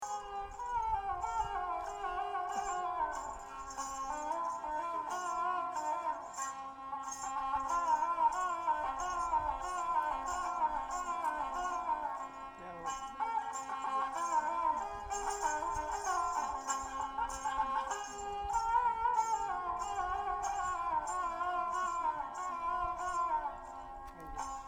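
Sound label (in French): musicien, Inde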